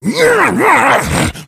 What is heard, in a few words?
shout, infected; npc, alert